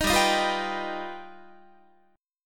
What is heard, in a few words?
G Augmented 7th, Guitar; G+7 strummed; JGuitar